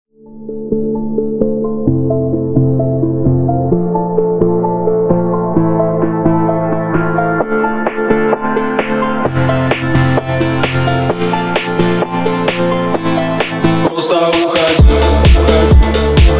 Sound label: drift phonk remix